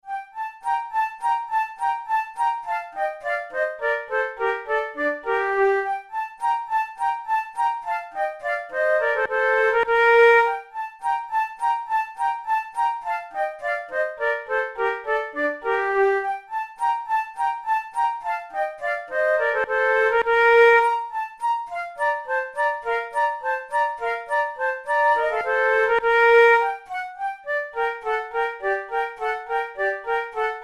gavotta; loeillet de gant op5 no10 ii

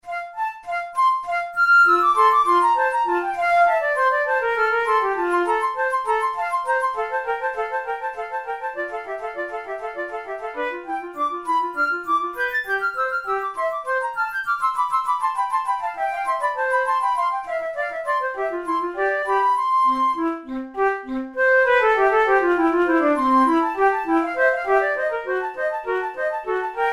bach invention no8 in f major duet, flute

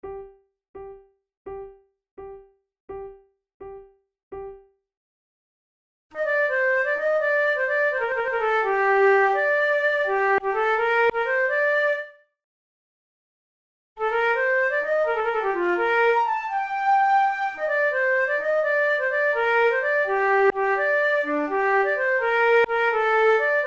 for two flutes gigue en rondeau; Monteclair; 6 concerts; flute tunes

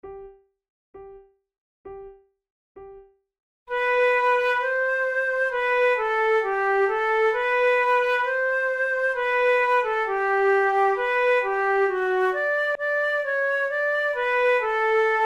hymn

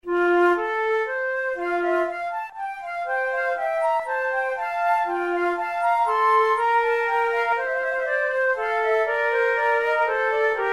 prelude, flute

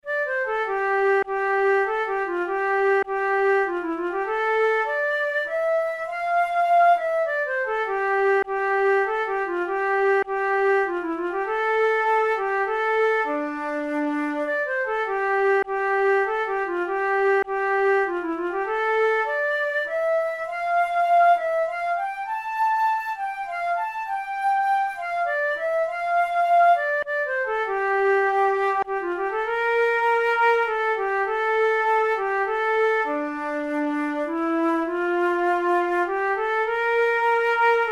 lullaby for irish pipes

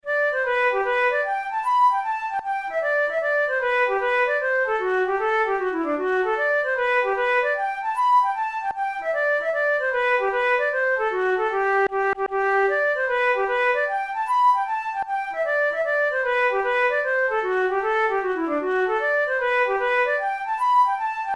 hornpipe, flute

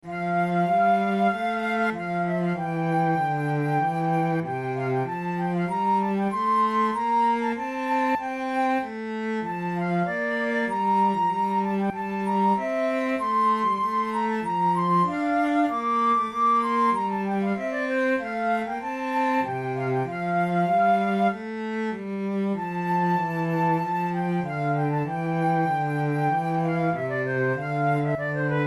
adagio, flute

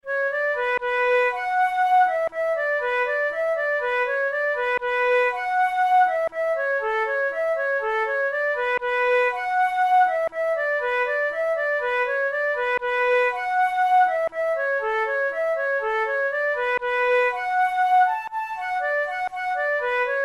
tune, flute tunes

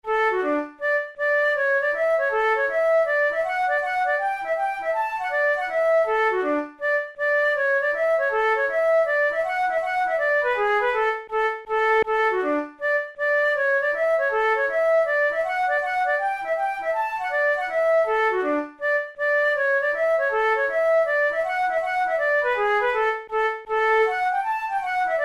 hornpipe, flute tunes; Nelson's hornpipe

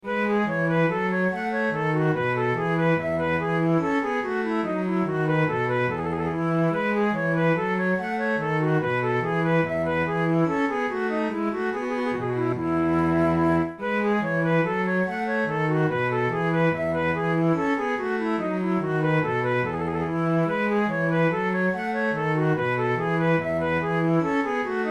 sonata in e minor, gavotta, flute; braun

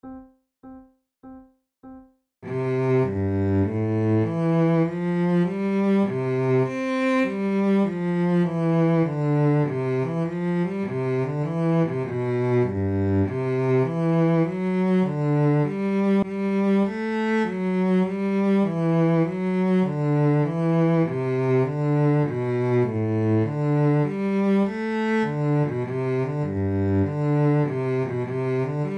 allegro, flute

